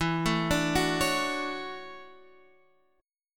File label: E 13th Suspended 4th; E134 Chord {X 7 7 7 7 9} arpeggiated